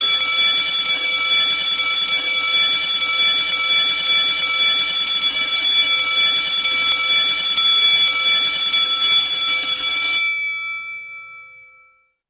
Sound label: School Bell